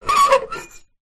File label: metal scrape on metal small; foley